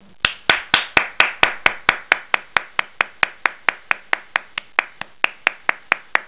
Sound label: APPLAUS; 999wavs